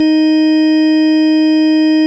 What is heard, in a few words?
VOX 8303 EB3, VOX CONTINTL, Vintage Keyboards; Big Fish Audio; First Call Vintage Keyboards